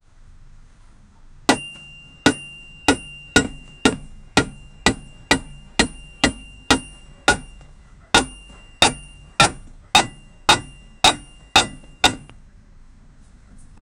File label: the scraping of a metal, clanging